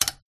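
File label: Lamp Twist On Off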